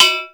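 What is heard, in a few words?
BELL, percussion kit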